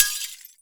glass vase break

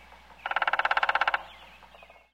woodpecker drum; woodpecker drumming

